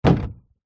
foley wood large board lean against wall

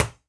toy plastic ball single bounce; sound effects one

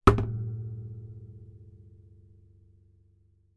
musical guitar acoustic lean against wall hard

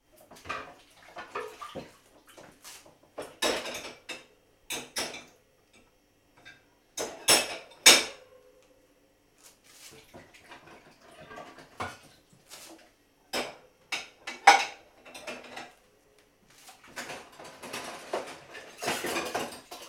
household washing dishes sink; sound effects one

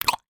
human eat chew sweet wine gum single chew; sound effects one

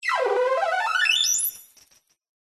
synth space weird synthy sci fi melodic power up; Jessey Drake

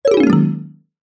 cartoon descend fall mallet

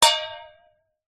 impact metal small cannister flask water wobble

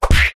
cartoon punch

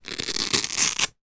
shin pads velcro rip; foley

